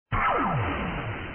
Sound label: blaster; vapesquared